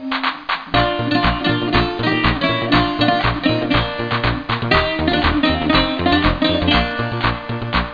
lover beat; Finland

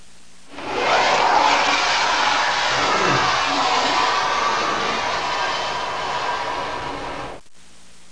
Hydra; The Heroic Legends of Hercules and Xena

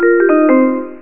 Vibs; Xenos F4 1.0

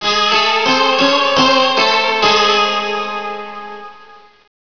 Lute; Realmz 6.0.3; The Family Jewels

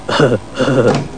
huh; krsnake

